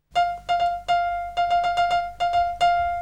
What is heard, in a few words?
sos sms